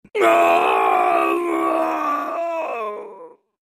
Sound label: scream; kano; mk9